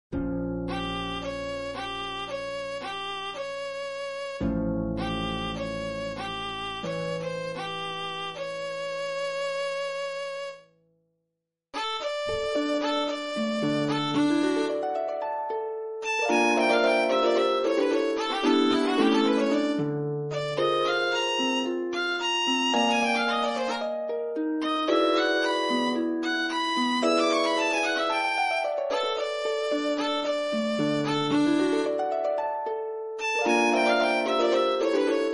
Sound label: Violin and Harp; Sonatina; Sonatina for Violin and Harp 1999 Movement 1